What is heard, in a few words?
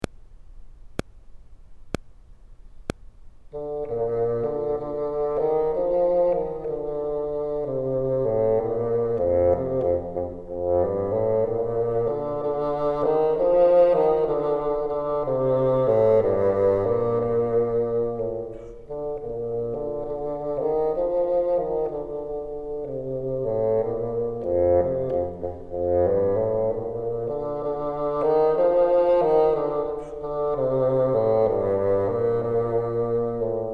bottom, bassoon